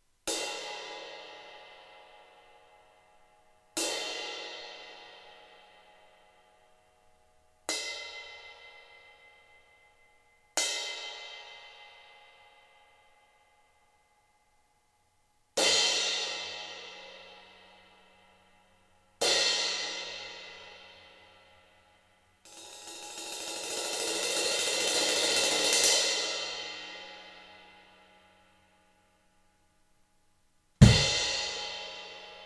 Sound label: Crash; Amedia; Dervish